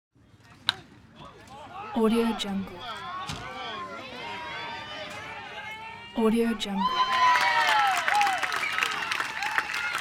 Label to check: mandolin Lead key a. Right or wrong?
Wrong